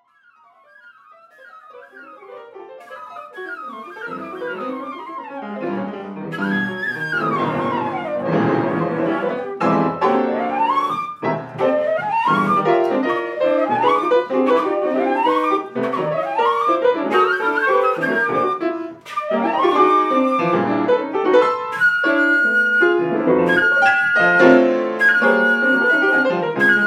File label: Chord Landings